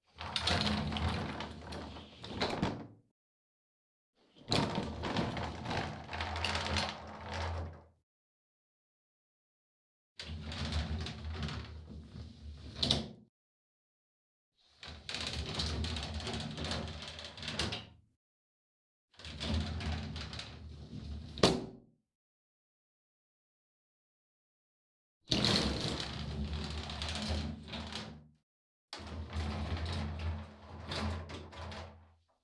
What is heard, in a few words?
shower cabin doors open&close1; NS Studios - ultra sound collection